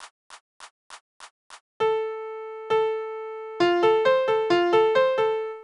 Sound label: piano quadruplets 8th